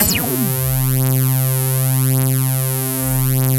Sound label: EFFECT, Explosive Jungle